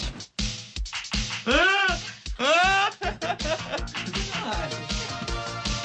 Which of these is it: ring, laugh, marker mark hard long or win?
laugh